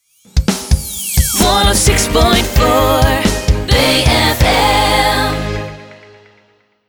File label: Jingle Sting